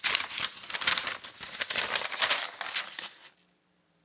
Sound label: paper move